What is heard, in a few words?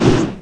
preattack; dota; creep